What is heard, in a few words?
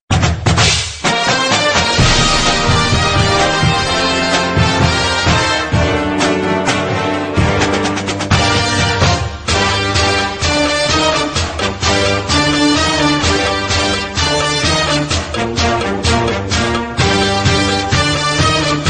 Marching Band Arrangement